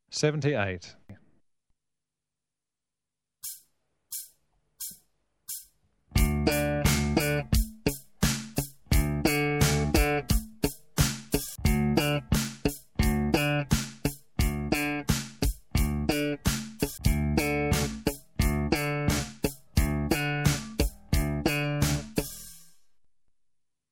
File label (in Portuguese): tercinas slap; baixo